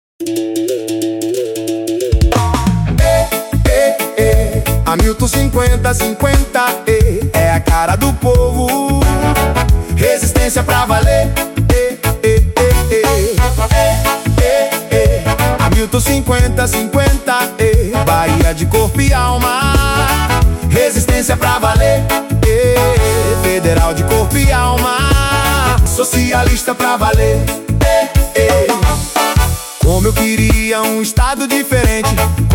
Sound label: jingle